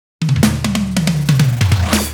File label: Fill